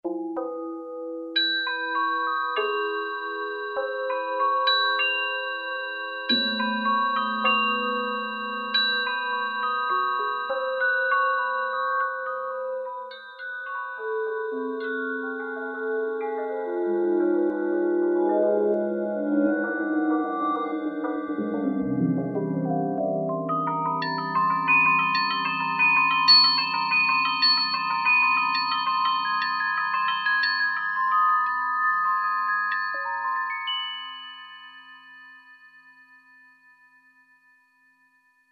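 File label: E-mu Morpheus, Atmosphere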